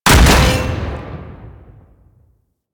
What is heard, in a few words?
Flak Cannon Shot; Grineer